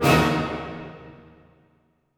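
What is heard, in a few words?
orchestra; Masterbits - Switch FX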